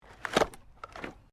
Wood Movement Minor

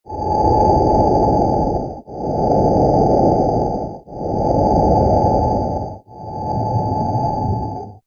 Alien Life Support Chamber Loop; Alien Sound Effects; Sci-Fi Sound Effect

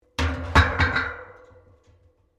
Pipes Metal Impact Tyre; Materials

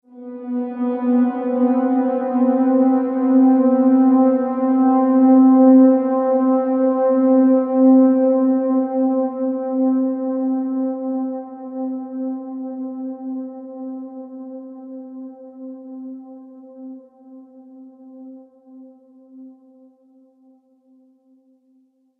Ethereal Ambient Transition; Bed Sound Effects

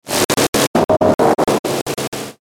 Stutter Pulse Static, Stutter Short Static Burst

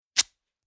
Fabric Tape Cloth Tare Rip Single Fast; Ripping; Objects

